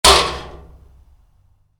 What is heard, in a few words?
Wood hit aluminium heavy, Wood, Impact: Aluminum, Heavy; Materials; Stuart Duffield